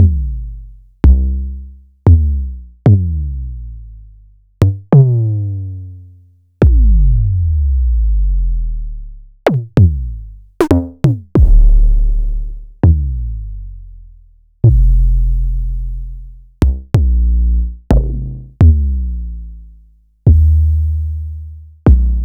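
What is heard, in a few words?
ms20 processed toms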